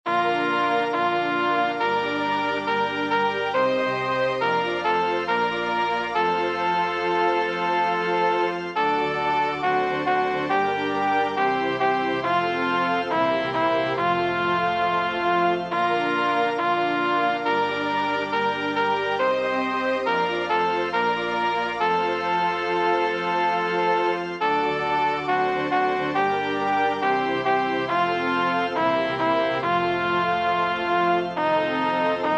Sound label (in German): Zum Gloria, organ; Schubert; Zum Gloria-alt